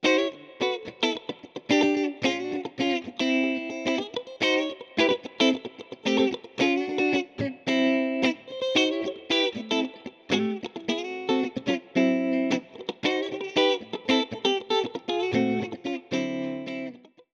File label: electric guitar